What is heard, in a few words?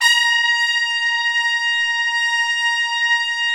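Trumpets Section f, Brass Sections; Roland LCDP06 Brass Sections; Tpts Sec.2-3; Tps Sec.3 f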